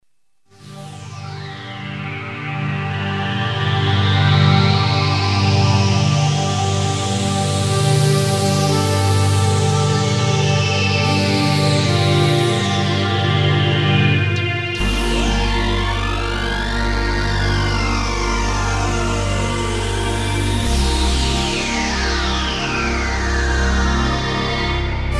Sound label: sweep